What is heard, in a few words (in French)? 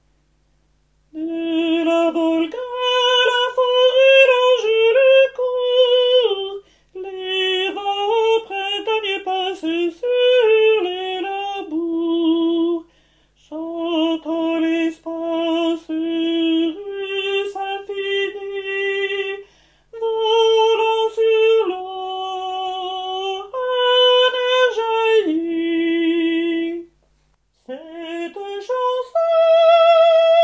soprani